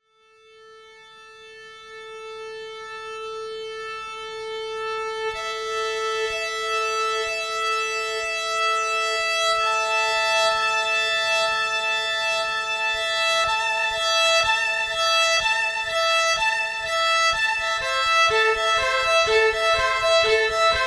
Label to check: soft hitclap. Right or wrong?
Wrong